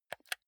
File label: console controller r1 press